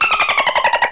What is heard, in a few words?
DOLPHIN, animal; 动物